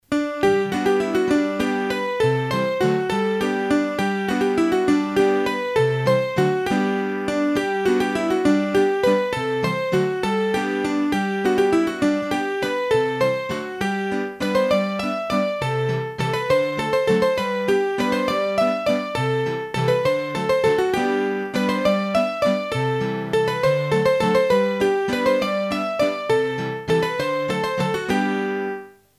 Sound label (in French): Bourree Droite, hurdy gurdy; trad; Massif Central; Bourrees a 3 Temp